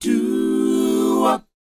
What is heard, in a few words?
DOWOP D; ILIO; Vocal Planet